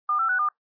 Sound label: voip connecting; tweb-i2p